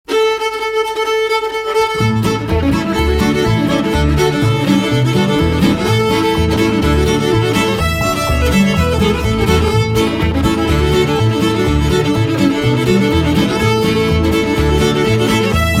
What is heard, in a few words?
instrumental